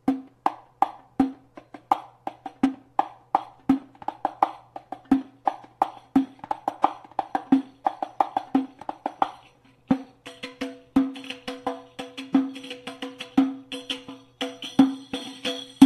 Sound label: dawr hindi riq